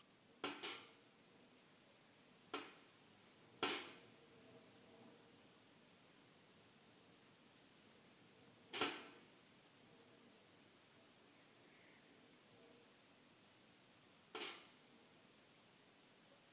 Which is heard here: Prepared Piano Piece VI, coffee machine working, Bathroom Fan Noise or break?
Bathroom Fan Noise